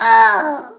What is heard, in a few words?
death; Alien Arena; ai